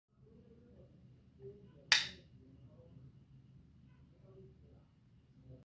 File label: Lamp on off switch; کلید روشن خاموش لامپ